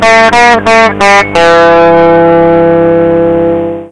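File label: bjt, guitar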